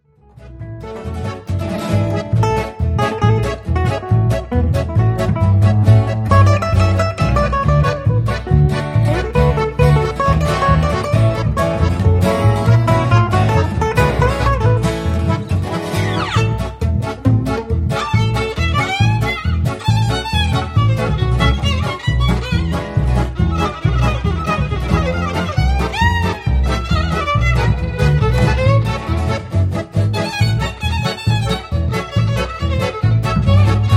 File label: swinging tiger